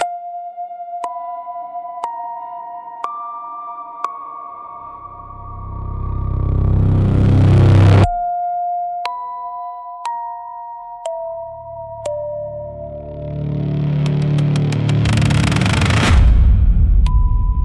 Spiritual & Spooky Soundscapes for Authentic Horror